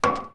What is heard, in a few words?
wall pole; Original TK Sounds